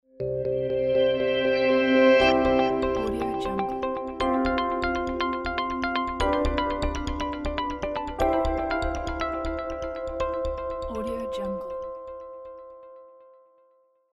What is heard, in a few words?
elegant logo